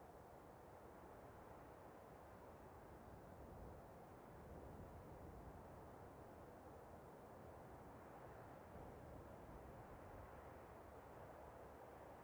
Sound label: Desert Exterior; Desert SFX